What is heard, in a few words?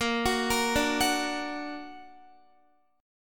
A sharp Augmented, down, Guitar; arpeggiated; tuning 4E,4A,5D,5G,5C,6F; chordshape